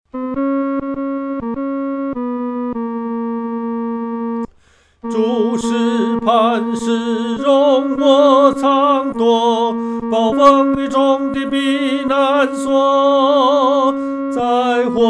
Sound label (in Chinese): 独唱 第三声